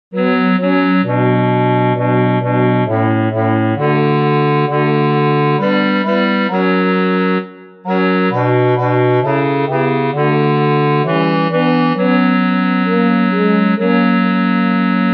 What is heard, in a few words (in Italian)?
Questa Notte BASSI